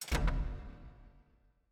spin button

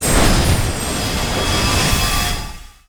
near Win; netent

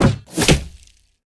scatter Land first; netent

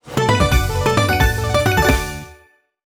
large Win; netent

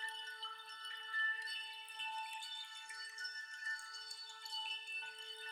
wild idle; netent

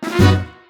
near Win; netent

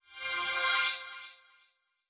combo Tease; netent

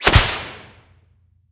random Wild Transform; netent

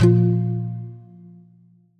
stop Button

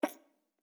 free spins star bounce; netent

